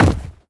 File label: human bodyfall soft; npc